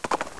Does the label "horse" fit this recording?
Yes